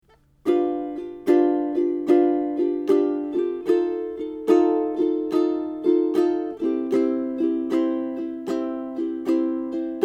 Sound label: Blues Turn, ukulele